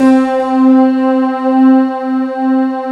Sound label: STACKED, STACK PNO